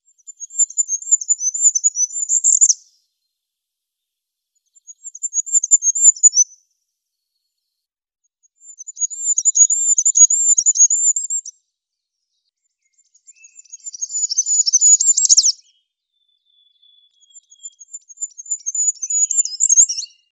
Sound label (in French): Roitelet huppé, chant plus varié, s'intensifiant puis descendant; ATLAS NICHEURS BRETONS 2004-2008